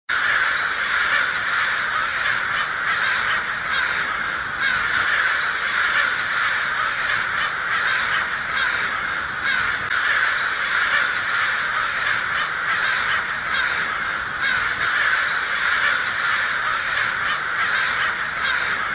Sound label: Crows